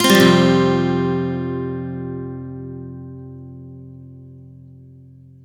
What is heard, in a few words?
F add9 upstroke, Acoustic Guitar; Sonic Foundry (Sony Creative Software); Songwriter Acoustic Guitar Companion; One Shot Chords